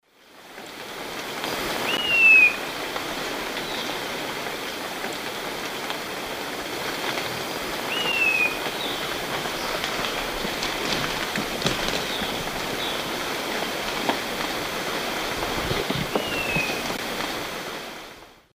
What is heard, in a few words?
Microbates cinereiventris, song; S. America; Eastern Lowlands